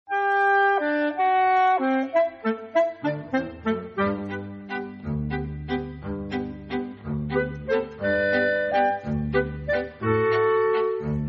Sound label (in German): Echowalzer, Kapelle